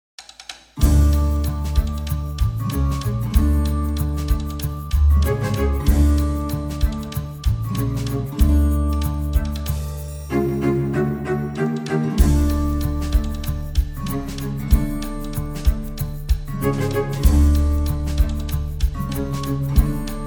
Tango

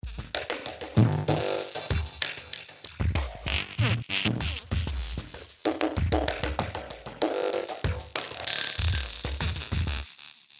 Funny Insects

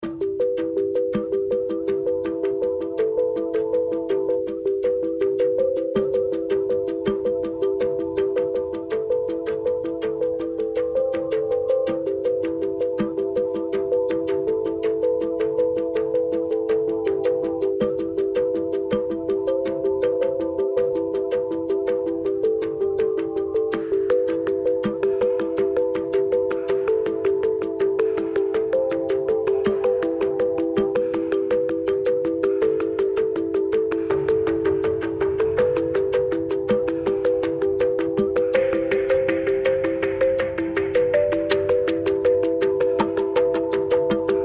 without piano

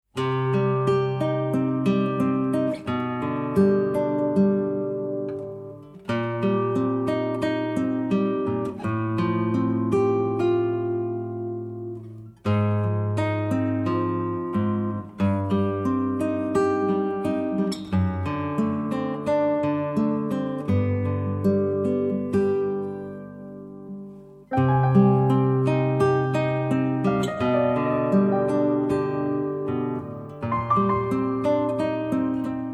Melancholia For Guitar And Piano

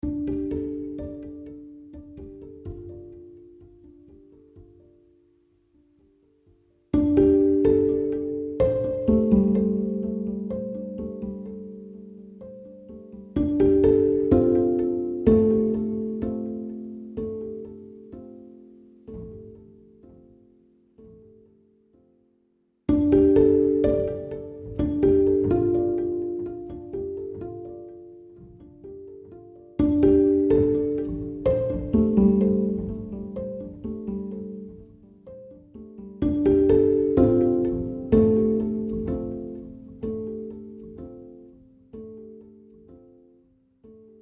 Love Taps

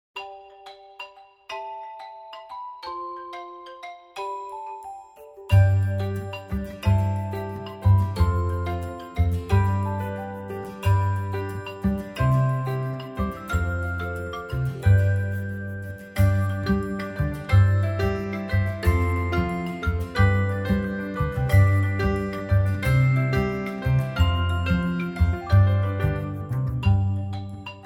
Xylophonia; variation

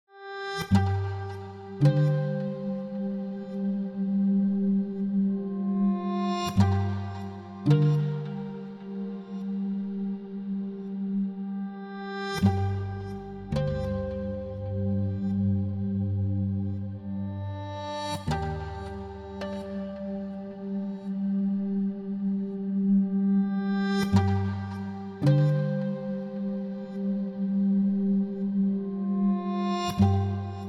Stopped Accents

